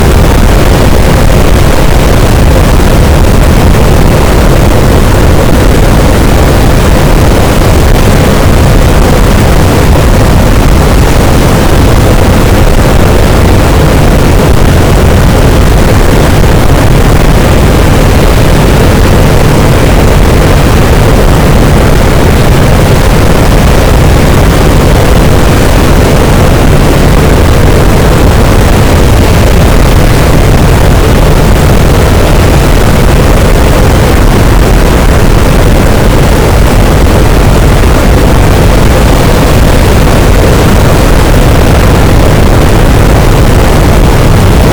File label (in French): cachalot, boat; Physeter Macrocephalus